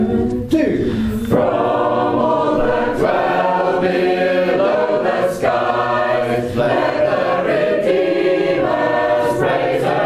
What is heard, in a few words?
Shape Note Singing; Bridgewater